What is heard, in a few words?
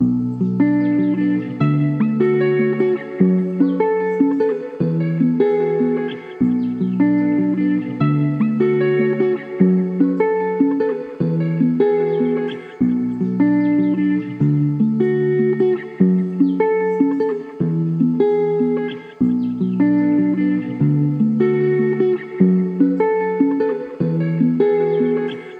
150 BPM B Min, Guitar; Blue Eyes; Cymatics; Infinity Guitar Collection